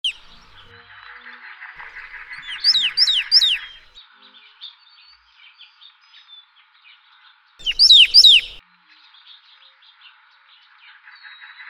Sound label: Pyrrhuloxia Singing; desert; usa; desert animals of the usa